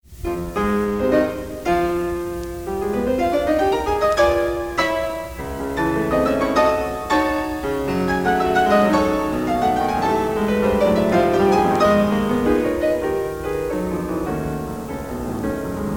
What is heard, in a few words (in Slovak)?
SONATA PRE HUSLE A KLAVIR